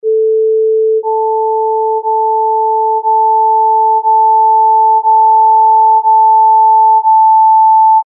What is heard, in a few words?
pitch ascending; eartraining